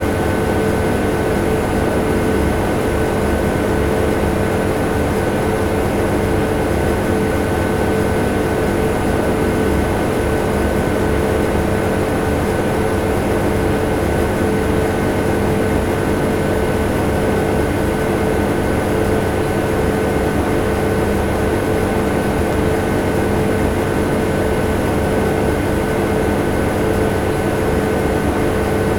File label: industrial refidgerators bar pub run whirr